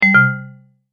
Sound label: multimedia tone error; Tones; Sound Design